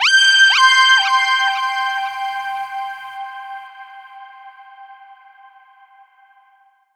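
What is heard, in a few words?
Pad Synth; Equipped Music; Loungin House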